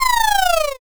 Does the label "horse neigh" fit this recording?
Yes